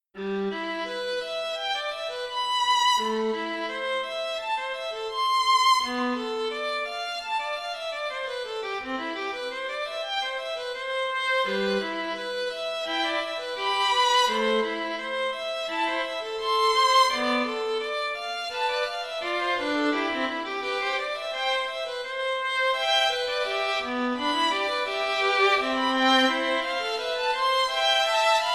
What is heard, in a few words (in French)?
duos pour violons